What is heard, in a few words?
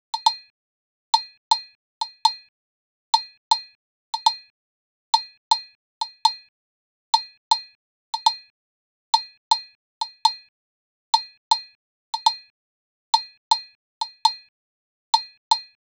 Cowbell